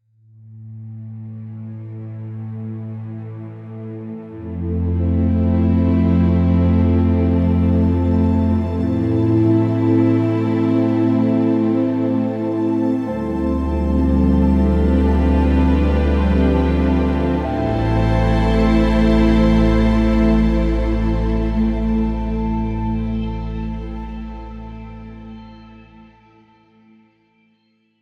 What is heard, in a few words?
pensive string backdrop